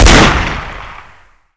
gun, weapons; xash3d fwgs; urbicide

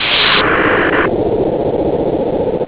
electro; weapons; xash3d fwgs

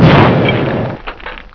stone explode; hurrican

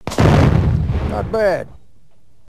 death; singe

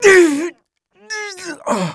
death; mohaa; dialogue

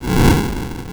flame cannon; superbroswar

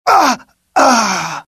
death, pain; mohaa; dialogue

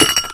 molotov pickup; cdogs

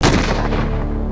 death; singe

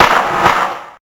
ice; scummvm; The Griffon Legend

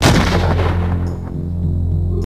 death; singe

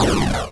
sound fling; singe; spacerocks

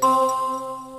tock; singe; Space Pirates HD

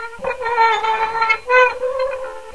wheelchair wheel; xash3d fwgs

